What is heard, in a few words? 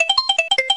moving Paddle Wheel; N64; YOSHISISLAND